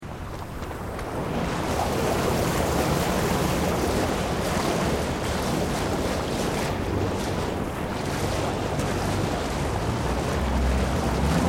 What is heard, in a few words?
Boat Water Taxi Starting Moving, Small water taxi; Boats (Sound Effects:Vehicles)